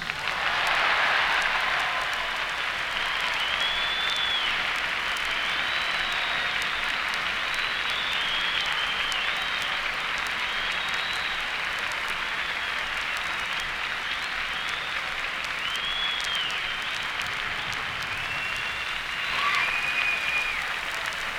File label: Applause Until Encores